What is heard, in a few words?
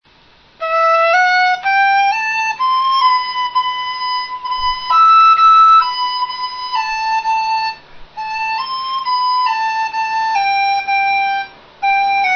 dizi